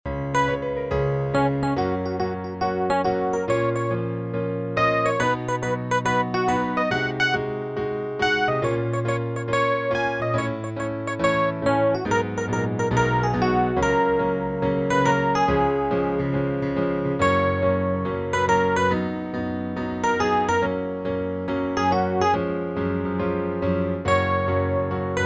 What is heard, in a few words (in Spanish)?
REFE PIANO; tono 2 piano solo; el mago de oz